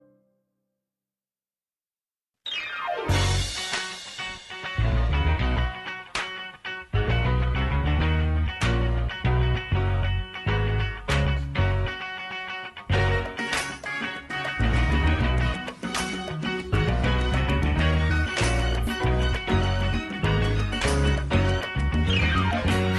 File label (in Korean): 영국여 노래